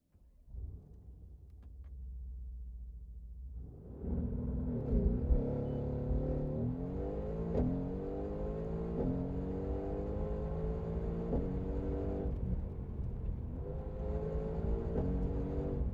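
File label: mercedes amg gtr onboard start fast drive stop off interior; 2018; DPA4021; Pole Position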